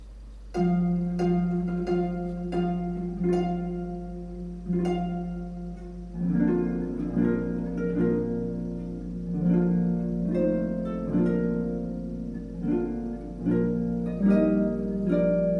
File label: Bridal March Harp